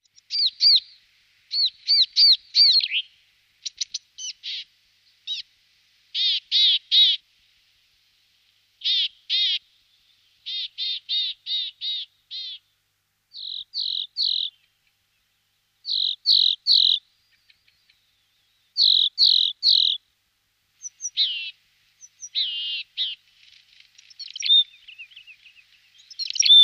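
serogolovaya gaichka golos, grey-headed chickadee voice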